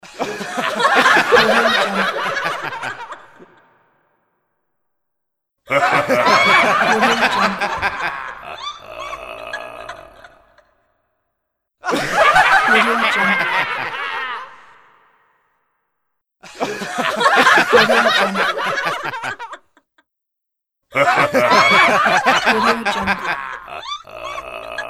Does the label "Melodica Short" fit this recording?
No